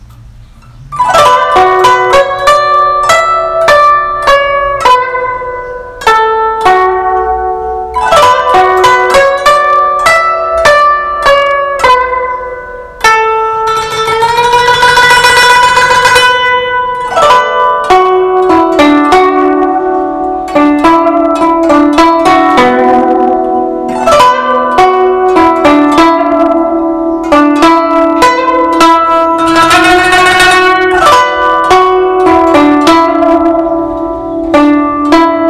古筝版

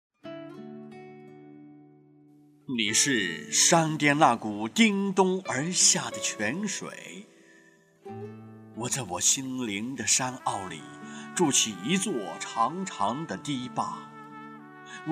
配乐

